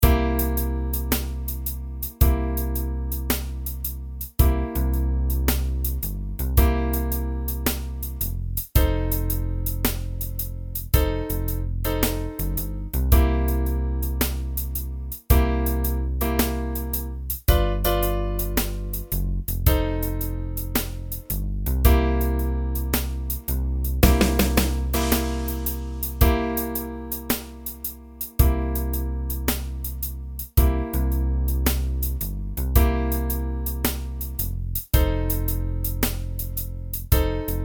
blues

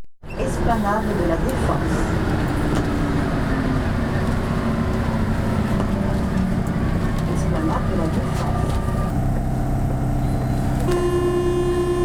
sound subway la defense

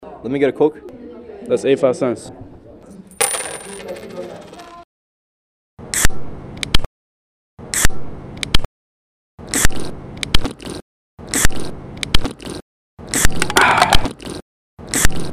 Recycle Rythms Can

